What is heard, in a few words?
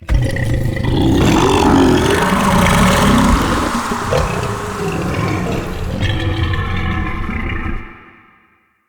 Corrupted Burp Toxins; Quest Beat; Bromius; Foley